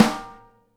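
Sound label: snare bottom DAMP, Live Snares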